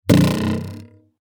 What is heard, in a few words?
arrow impact